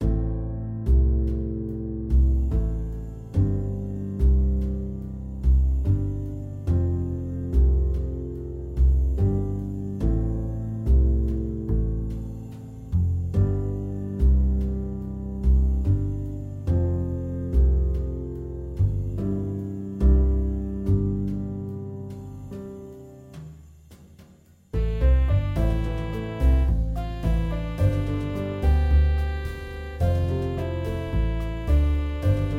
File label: jazz ensemble; springtide; sargent jonathan